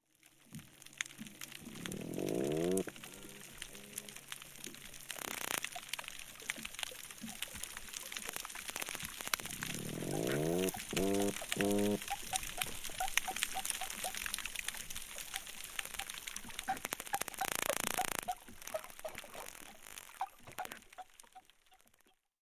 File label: Toadfish Grunts